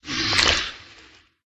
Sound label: tsar scorpion attack voice bottom